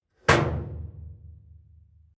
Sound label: Industrial Small Metal Door Hatch Closed Metallic Long Ring Out; Tom Hutchings